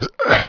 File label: Henchman Climb up; Playable Tutorial Level; TR2